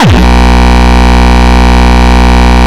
Longkick, kicks

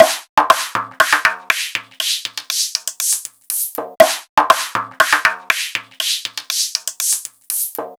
Frame Drum Loop, Ethnic Instruments; Sonic Foundry (Sony Creative Software); The Electronic Music Manuscript Collection

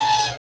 MOV; Total Control - Action Cutz; COMBAT

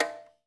ZULU, ETHNIC DRUMS; Spectrasonics; Liquid Grooves 1 & 2

